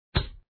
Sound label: Blunt Hit